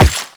Run Rock, Footsteps

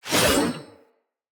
Short Sword Heavy Whoosh; Weapons

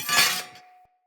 Sphere Sword Whoosh Accent; Weapons; Swords; Sphere Sword And Board

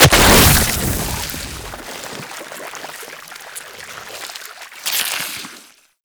Inked Enemy Death; Warframe; Tenno; Ink Rifle

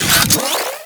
Air Pistol Equip; Crp Air Pistol

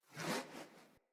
Great Sword Whoosh Accent Air; Weapons; Player; Swords; Mendicant Great Sword

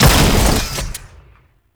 Flak Cannon Fire; UT Weapons

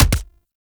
Pistol Punch; Warframe; Weapons; Tenno; Lasrian

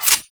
Rifle Reload; Weapons; Warframe; Zariman

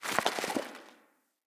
Organic Pickup

